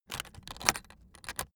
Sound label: aluminium case open close